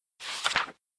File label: page Turning